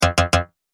Multimedia Triple Button; Andrew Fleming

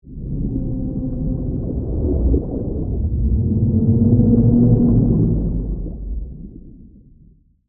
Sea Creature Move, Horror, Moan, Whale, Song; Monster Sound Effects; SoundEffects-BlastWave

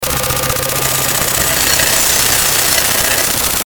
Chopper Pan Electrical; Blastwave; Chopper Sound Effects

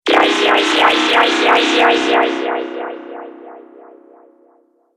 Laser Space Sick, sci-fi laser sound effect; Laser Future

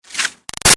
Arrow By Airy Fast; Bows and Arrows; Combat; Big Room Sound

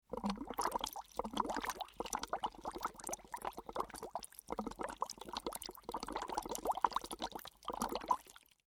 Water Bubbles Straw Blow; Nature

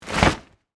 Wings Flap, Bird; Animal; Bird Sound Effects; Birds (Sound Effects:Animals)

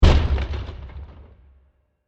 Enormous Impact With A Grand Reverb; Bursts; Impacts; Crafted Signals

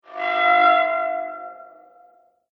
Alien Warning Sound

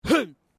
MALE GRUNT; Male Vocal sounds; Grunts (Sound Effects:Voice)

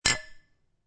Glass Clink Two Thick Glasses Clinking Together; Glass Sound Effects

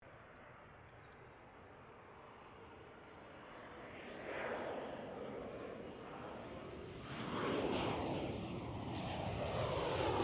AIRCRAFT CA-27 SABRE PASS STEREO

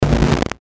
Stutter Electrical Short, Stutter Short High Tone

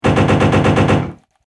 Machine Gun Fire; Machine Gun Sound Effects

